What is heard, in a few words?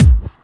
Hard Kick; Vengeance Samples